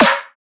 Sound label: Snare